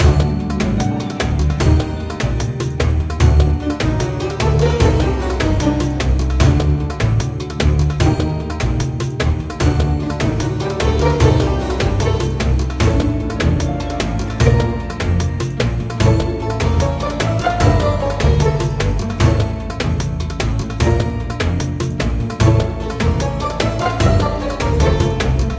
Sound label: wild Spin Music; wild bazaar